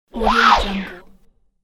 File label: interface swooshy slide